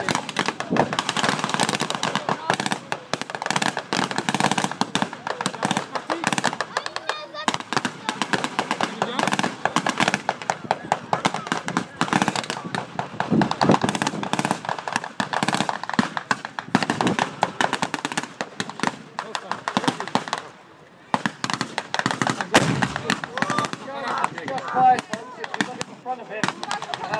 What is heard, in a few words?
gunfire at the victory show